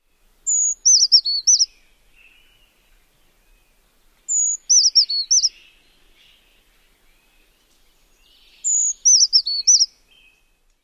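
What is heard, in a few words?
songs, Brown Creeper; AZ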